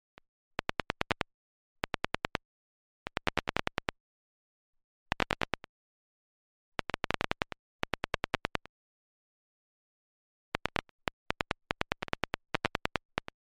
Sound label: ticks